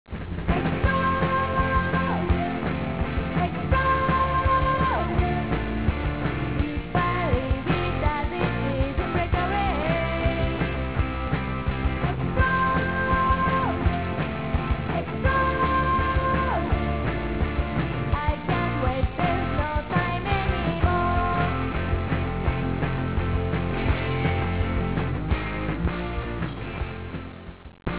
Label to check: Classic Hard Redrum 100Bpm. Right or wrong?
Wrong